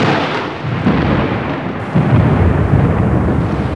THUNDER; Zero-G Ambient 1; THUNDER+RAIN

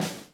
SNR, DRUM ZONE; Masterbits - Total Zone

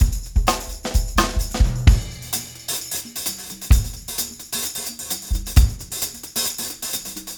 Motown, R&B Drums; Legacy; Drummers of Motown

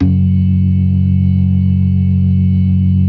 DRAWBAR VIB, Synth and Organ

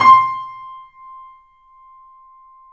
C, The Piano; InVision Interactive - The Piano

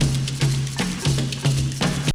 BREAK; Zero G - Man Machine

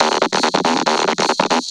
Treat Guit, Industrial Nasty

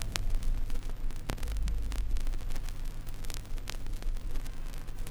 Crackle, Vinyl Noise

